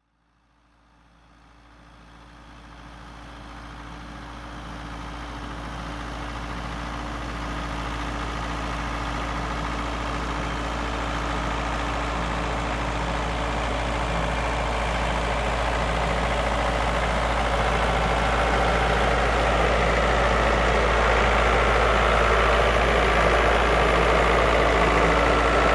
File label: Tractor passing by dragging farm machine